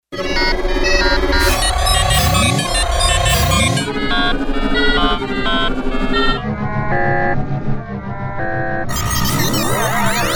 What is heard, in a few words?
Gated FX